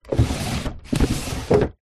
household drawer open close bedside cabinet wooden